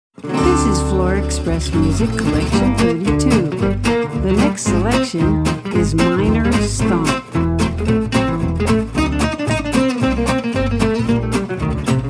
Minor Stomp

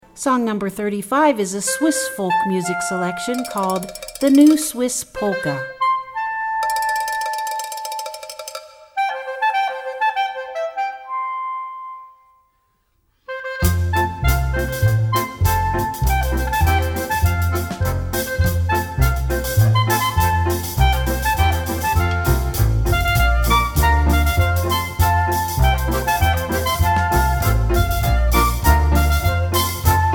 Swiss Polka

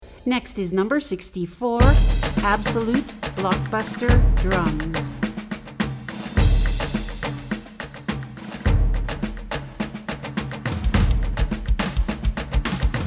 Blockbuster Drums

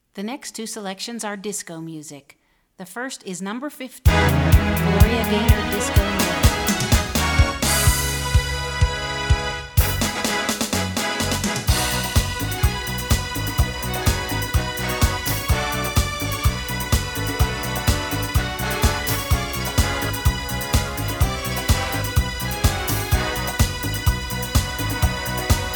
Disco Medley

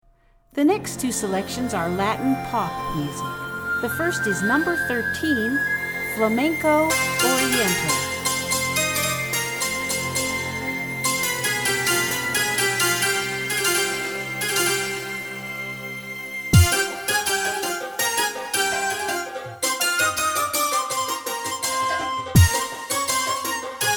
Flamenco Oriental

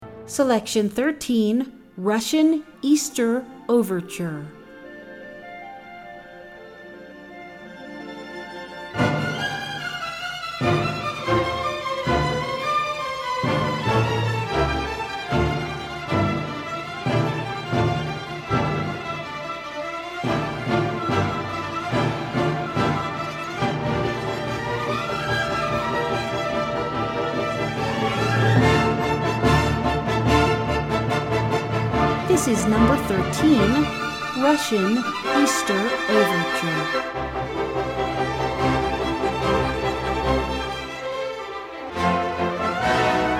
russian easter overture, classical

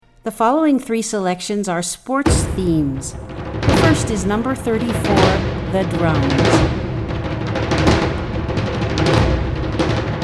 The Drums

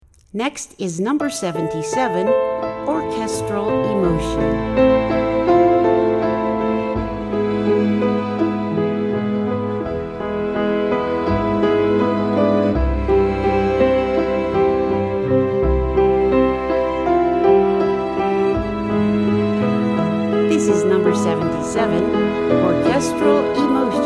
Orchestral Emotion

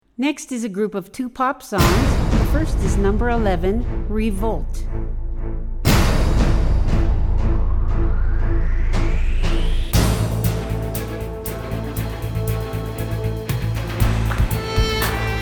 Violin

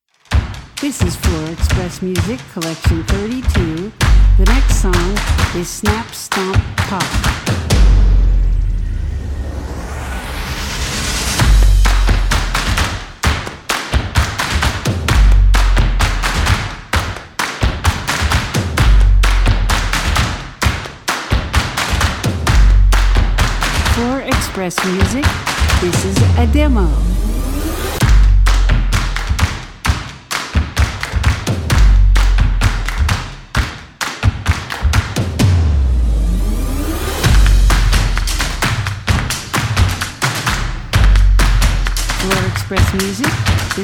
Snap Stomp

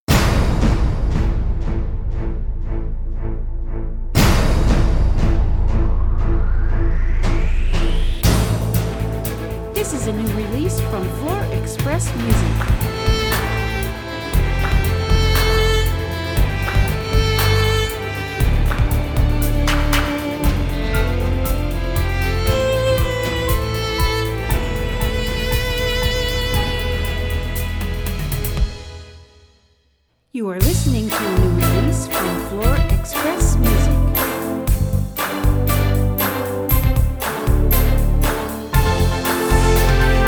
Violin

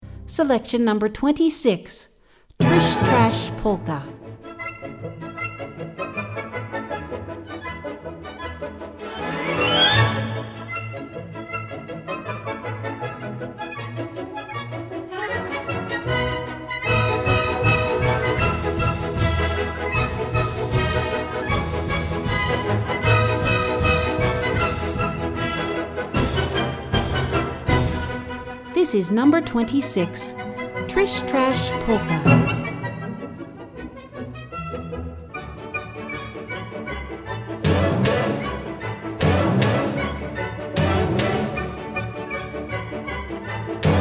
Polka; Tritsch-Tratsch Polka

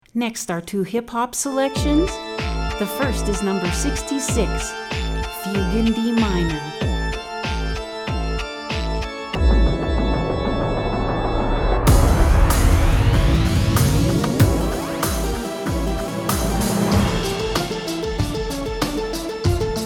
Fugue In D Minor

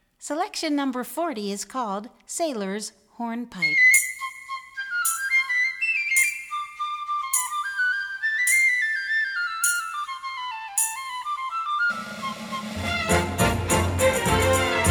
Sailor's Hornpipe

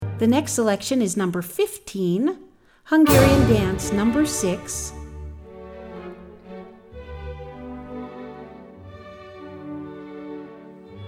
hungarian dance 5, classical

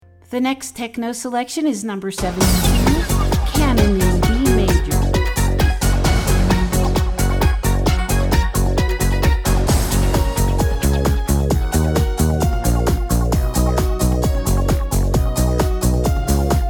Canon In D Major

